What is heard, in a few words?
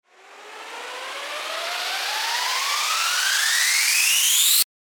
RISER